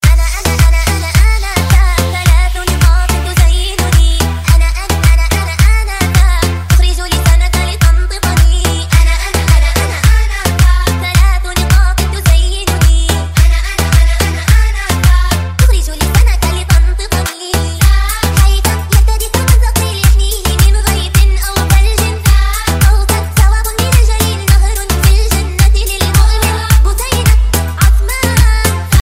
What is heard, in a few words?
arabic, ringtone